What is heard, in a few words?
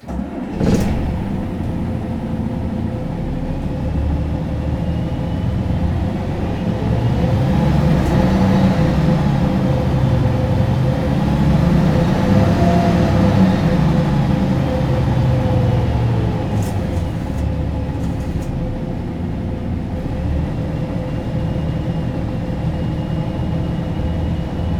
bus start stop inside, street traffic; best service Blue Box